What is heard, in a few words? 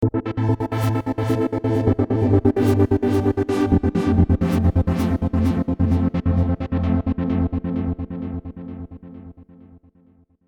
Trancegate